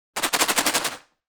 screw box rattle; nut

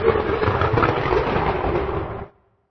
Exhaust, muscle car exhaust pipe

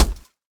fist punch; kung-fu

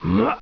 jump grunt male

